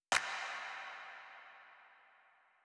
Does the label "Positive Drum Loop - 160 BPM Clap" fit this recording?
Yes